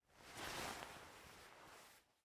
Player Sit; Game rip; MiSide

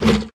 Enemy Attack; Game rip; MiSide

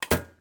Door; MiSide

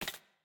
Step Wood Noise; MiSide

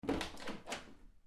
Door Handle; Game rip; MiSide

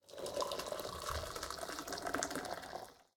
Coffee Water; Game rip; MiSide

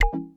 Take Flash; Game rip; MiSide

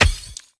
Creepy Hit; raw game audio files; Mita; MiSide

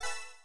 New Message; MiSide

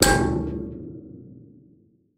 Tube Hit; Game rip; MiSide

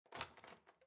Door Open; MiSide

